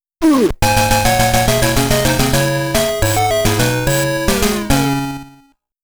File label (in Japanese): ミス ／ ゲームオーバー